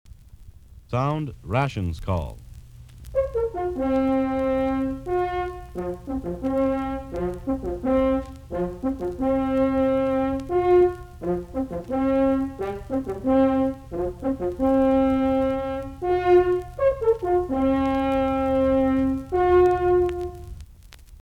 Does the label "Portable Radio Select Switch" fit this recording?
No